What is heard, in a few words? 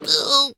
weird effort; Nut Boarding